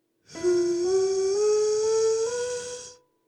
mixed voice falsetto

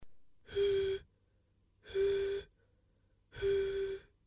Pure falsetto ng

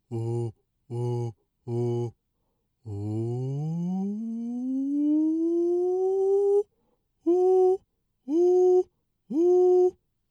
clear falsetto practice

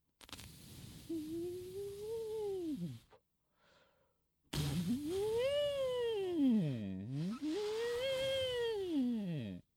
practice small voice

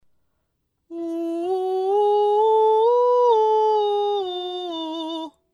mixed voice soft mix voice

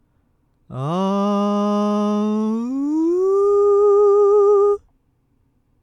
glissando mix

